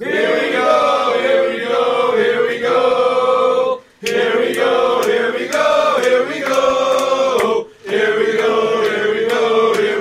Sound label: here we go fan chants; redbulls